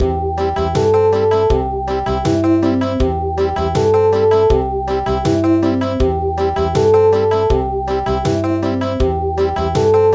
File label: Country, midi